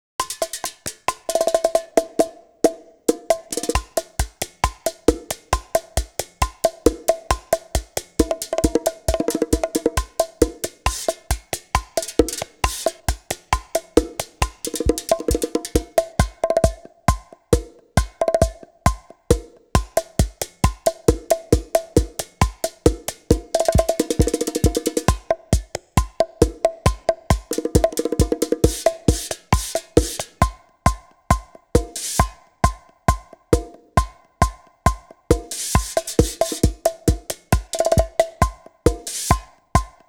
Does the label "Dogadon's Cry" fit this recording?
No